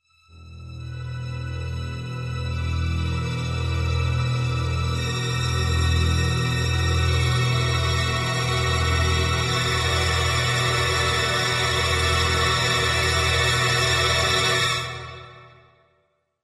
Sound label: Tension; warcraft